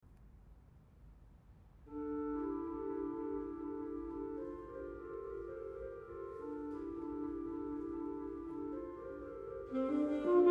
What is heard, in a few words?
Improvisation